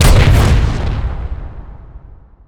land mine; techies

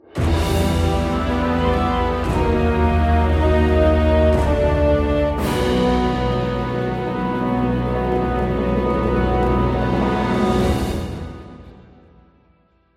lose; monster hunter

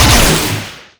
lightning storm; weapons; dota; leshrac

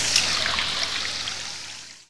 thunder clap target; weapons; dota; brewmaster

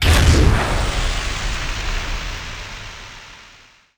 infernal blade target; weapons; dota; doom bringer

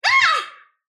sylph pain; dota; dark willow

